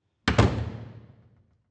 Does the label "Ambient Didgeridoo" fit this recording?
No